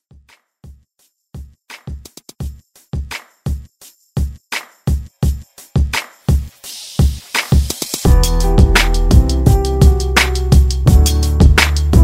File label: Throwback Redrum